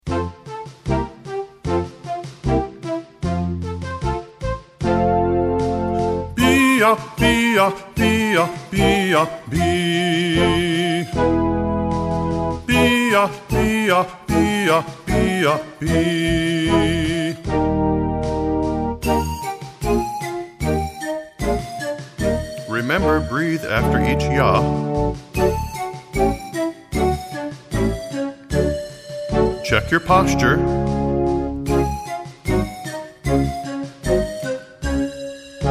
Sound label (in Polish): cwiczenie bee yah